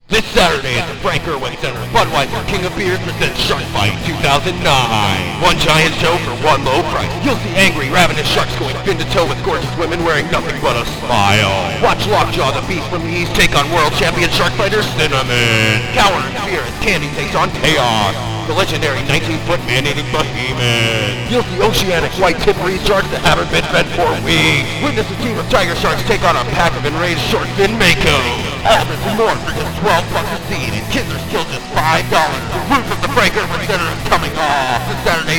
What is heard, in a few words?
shark fight with music